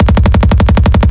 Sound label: loop nshk bass, guns tanks